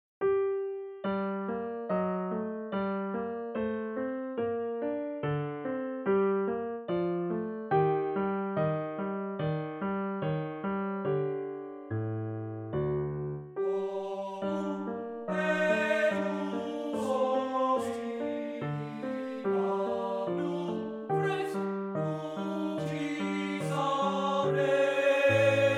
Beim Mondschein Lied per voce e pianoforte; Vogler

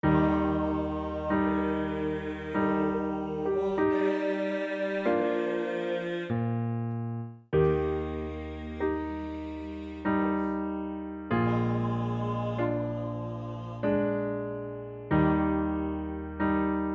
Lied per voce e pianoforte.; Enslin; An mein Klavier

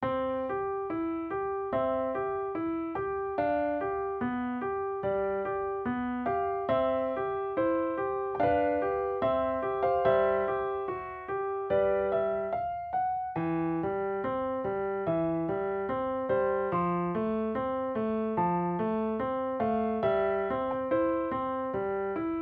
Romance per pianoforte Adagio; Koehler

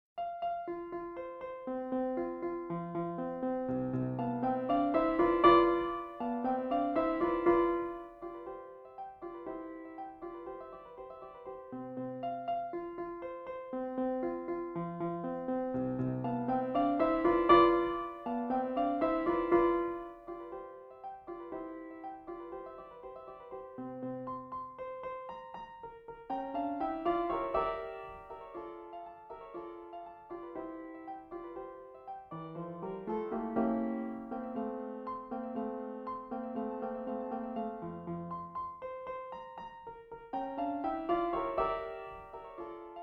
Capriccio per Piano; Schmittbaur

